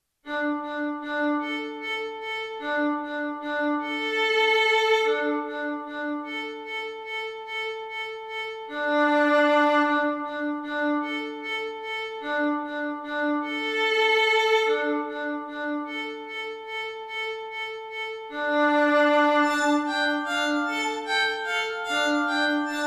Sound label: Violin Fun